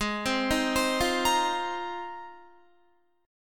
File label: G sharp 6th Add 9th, Guitar; G#6add9; arpeggiated